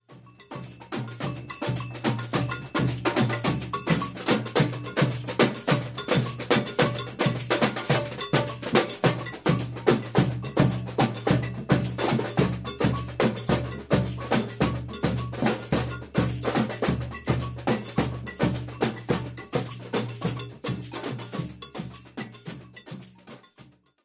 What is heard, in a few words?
Baiao Rhythm; rhythms